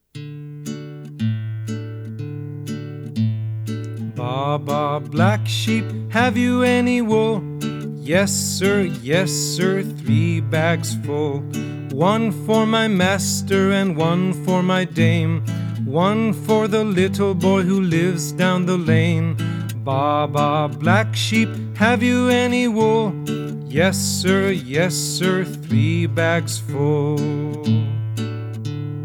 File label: Baa Baa Black Sheep, Animal Songs